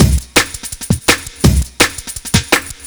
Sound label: Dispatch Break, 2A, Jungle Breaks; Blu Mar Ten - Jungle Jungle - 1989 to 1999 Samplepack